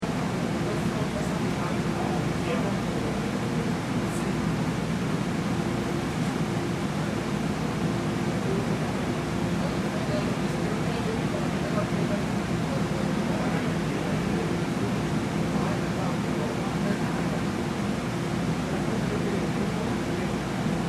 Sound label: Room Tone Subway Train Interior Stationary Faint Voices; Urban Spaces